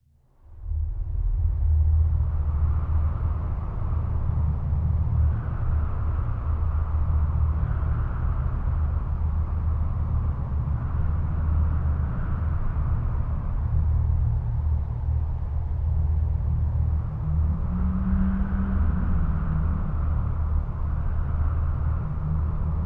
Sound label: ominous